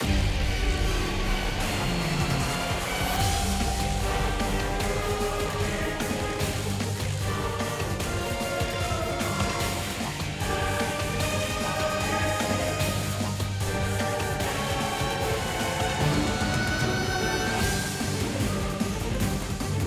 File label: Battle Boss; SevenNightsFireEnnio Phase 02